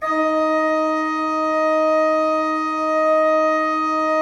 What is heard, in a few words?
D4, Cathedral Organ; ILIO; Propeller Islands